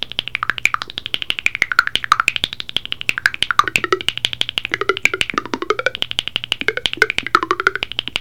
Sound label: clak roll, jew's harp